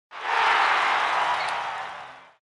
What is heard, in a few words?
victory; cheesy-world-of-adventure; parachute